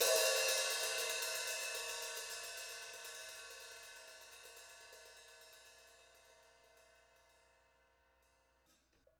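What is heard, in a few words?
hi-hat Custom Mostly Open Hard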